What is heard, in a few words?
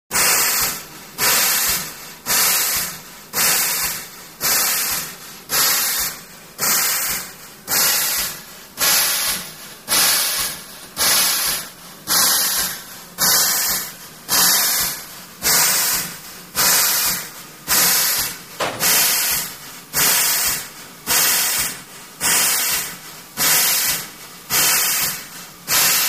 Steam Chuffs Train